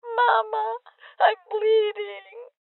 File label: Voices Injury Female Old